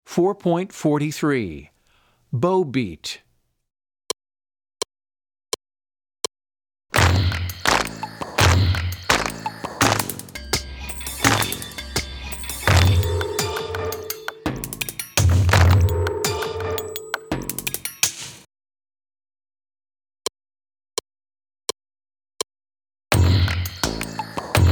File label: Bow Beat